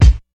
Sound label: strong bottom end kick b key